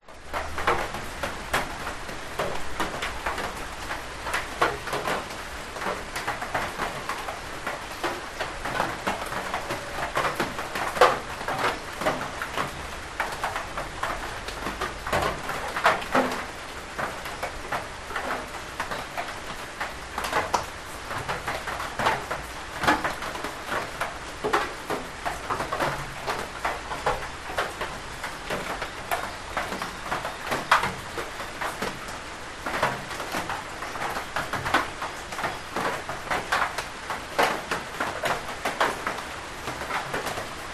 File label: nature rain drips on verandah roof